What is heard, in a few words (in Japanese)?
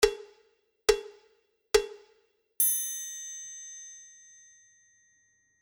ポクポクポクチーン